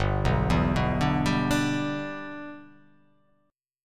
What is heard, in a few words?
G 6th